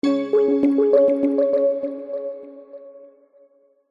alert dreamy soft warm drippy delayed bells finish end